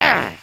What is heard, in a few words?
Get Hit Oneshot; creatures organic